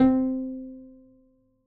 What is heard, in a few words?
KEY (C), Melodic One Shots; Archer